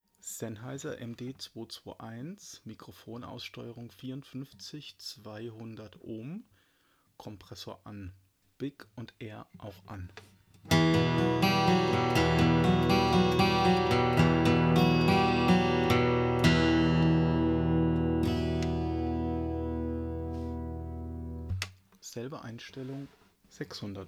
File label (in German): Taylor12 mit dynamik Mic Comp mittel